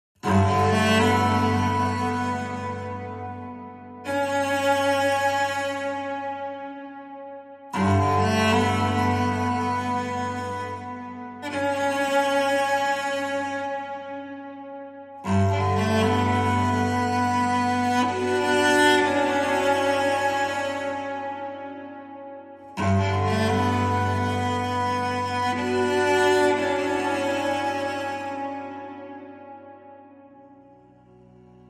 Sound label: Cello Only